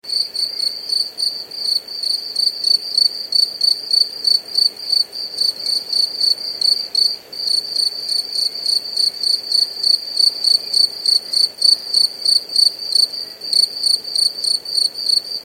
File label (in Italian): cigada